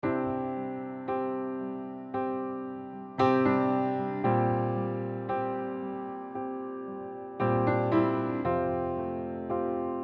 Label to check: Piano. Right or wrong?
Right